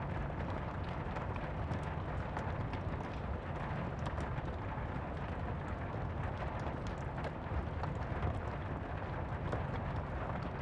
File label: atmos rain spit indoor